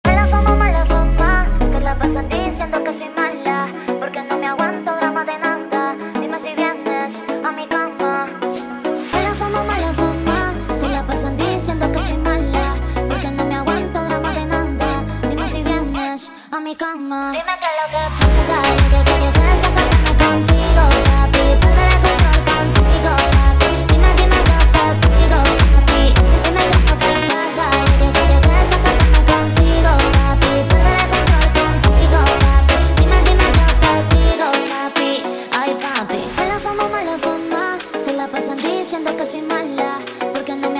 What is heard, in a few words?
Montagem sped Up